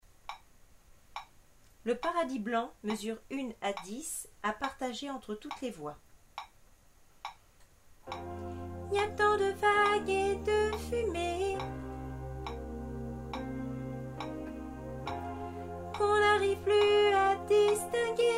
introduction

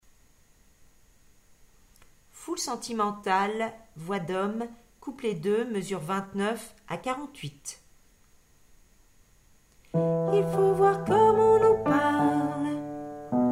hommes

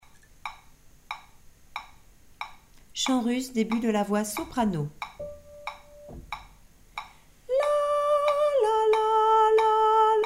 voix soprano; debut voix soprano

